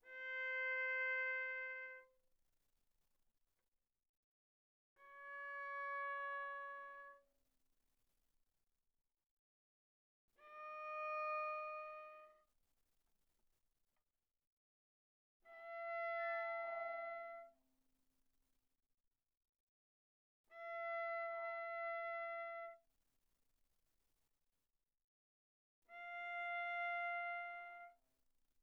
Viola arco sul G pp C5 Gb5

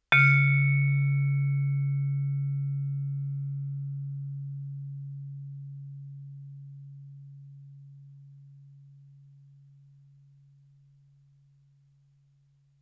Vibraphone sustain ff Db3 stereo; MIS Pitches - 2014; Percussion

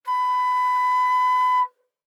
Flute nonvib ff B5 stereo; Woodwinds

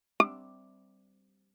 Viola pizz ff sul A D6; MIS Pitches - 2014; Strings

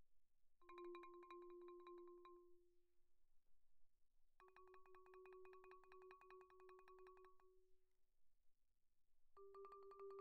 xylophone hard rubber roll pp F4 B4; Percussion

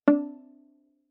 Viola pizz ff sul G D4; MIS Pitches - 2014; Strings

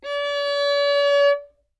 Violin arco ff sul D Db5; MIS Pitches - 2014; Strings

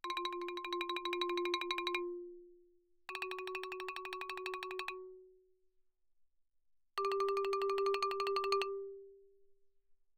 xylophone hard rubber roll ff F4 B4; Percussion

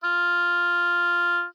Oboe ff F4 stereo; Woodwinds

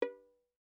Violin pizz ff sul A B4; MIS Pitches - 2014; Strings

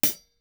hihat normal ff; Percussion; Cymbals

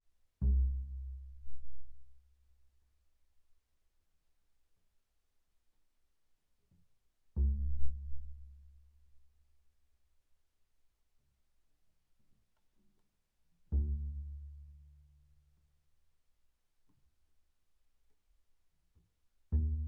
Bass pizz sul E pp C2 B2, double bass

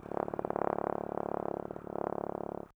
Bass Trombone ff Db1; MIS Pitches - 2014; Brass

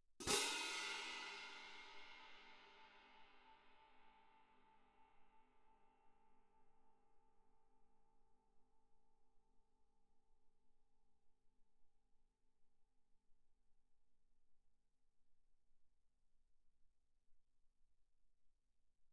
18 orch crash, french, mf, crash cymbals; Percussion

